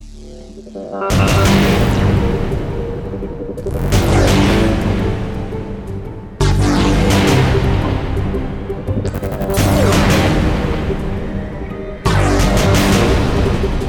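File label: Battle G minor 170 bpm Loop; Disney Infinity 2.0 Marvel Super Heroes; Arachna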